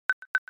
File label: Interval Upcoming; iOS Sounds